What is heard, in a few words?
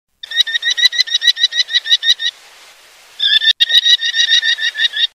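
Merlin, bird; ترمتای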